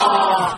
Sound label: "ah" crowd, Voice; ooh ahh